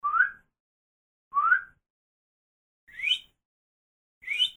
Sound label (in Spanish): Silbido; Banco de Sonidos - Facultad de Art; Lazzeri - Gallichio - Alperte - Calvo